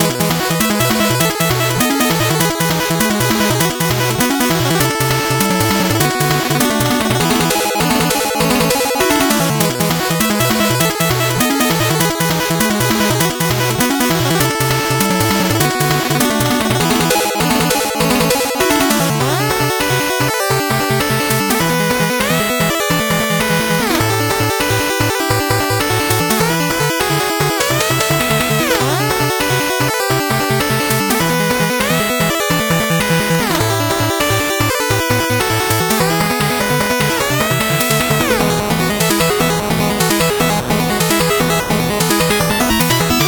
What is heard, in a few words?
8bit bgm normal